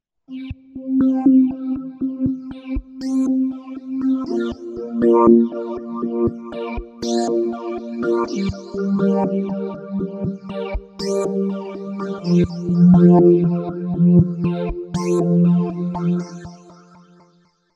Rhythm Throat, Roland V-Synth; Factory Patches

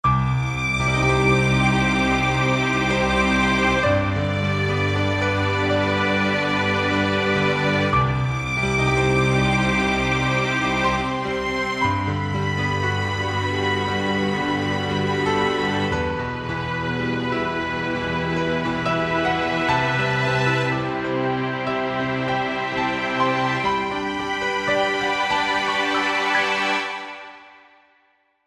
Piano String Bright; Sonic Reality Modern Songwriter

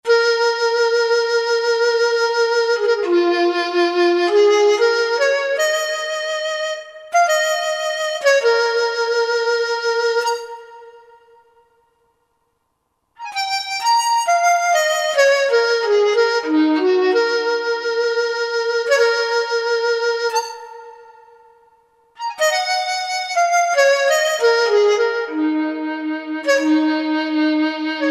Qu Di Vibro; Roland SR-JV80-14 World Collection Asia

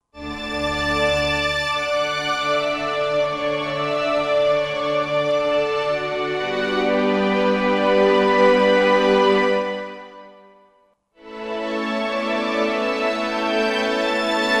Violins, Roland SR-JV80-16 Orchestral II

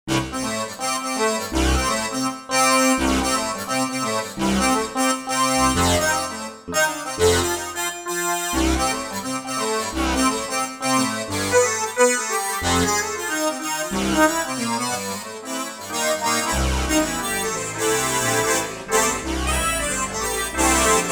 Box Lead, Roland JV-880; Factory Patches

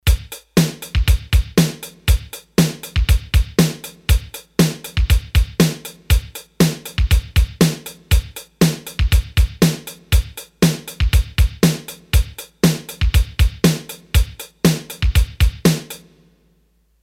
8 Beat, Roland D-10; Rhythm Demos

